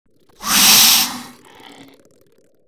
greetings reptilian special; Stellaris

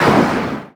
crystal projectile fire, weapons; Stellaris

